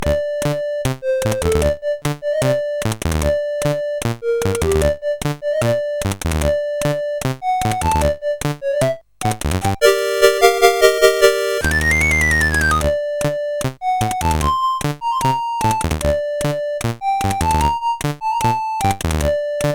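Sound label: Tango, MOS 8580 R5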